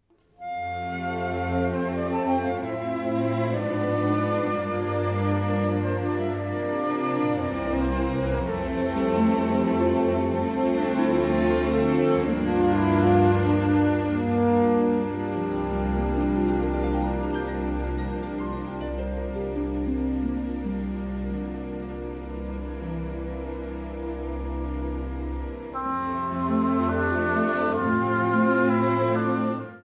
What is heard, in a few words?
Instrumental